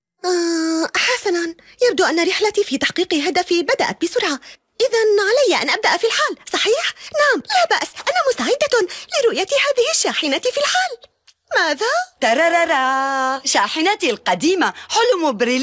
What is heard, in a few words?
vocals